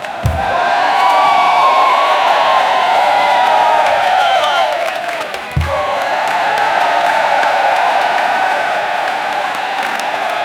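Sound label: rock n roll crowd cheering, concert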